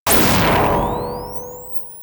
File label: pulse laser; weapon